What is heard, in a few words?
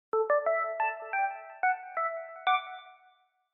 notification; Oppo; Find Melody R8111